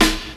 airy snare drum f key, steel snare drum sample tuned to the F note; snare drum samples